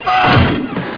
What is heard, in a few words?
fallin